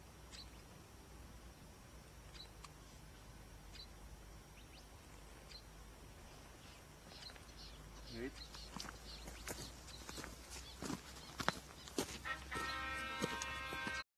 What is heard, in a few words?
woodpeckers